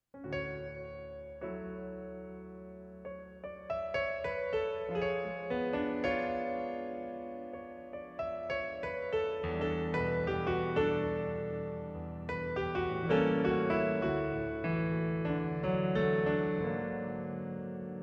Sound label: piano solo